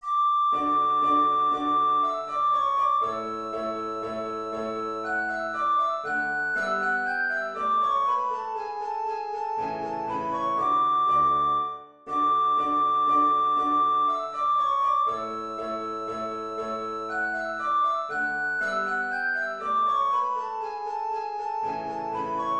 danse allemande ré majeur; haydn joseph

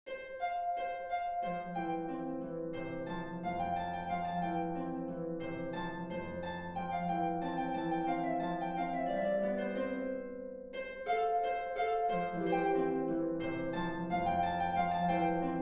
gavotte majeur; corelli arcangelo

